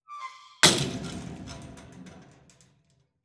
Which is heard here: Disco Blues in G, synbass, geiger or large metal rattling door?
large metal rattling door